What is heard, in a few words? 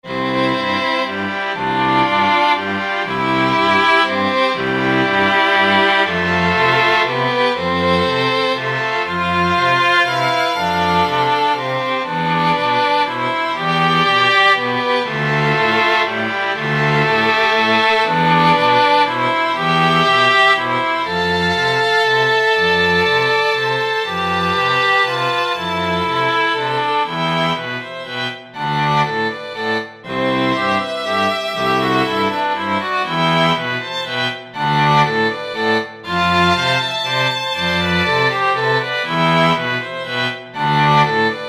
quartet